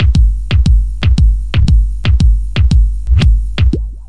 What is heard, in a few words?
117 swingy reverse offthing, drum loops and breaks